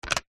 household plate plastic childs set down wood table